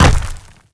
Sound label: land, footsteps, player, dirt